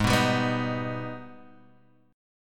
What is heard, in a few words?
Ab Minor Major 7th, down, Guitar, Standard; AbmM7; strummed